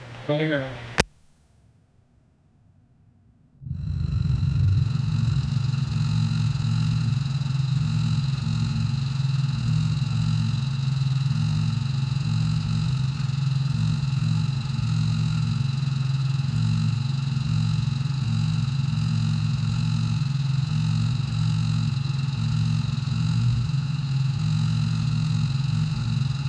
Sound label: 4 cylinder engine, car sounds; 12l na engine